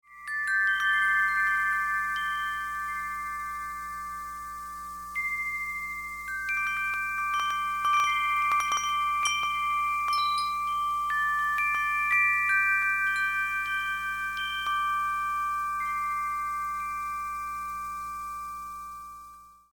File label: Chime; Magical Mystery; Calypso Island